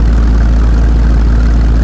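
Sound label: Audi Rs6 Idle; car simulator 2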